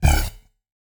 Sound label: open big interface; Original TK Sounds